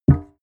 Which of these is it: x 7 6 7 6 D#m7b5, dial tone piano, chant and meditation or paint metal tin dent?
paint metal tin dent